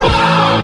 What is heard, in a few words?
"wah" SUNG; ooh ahh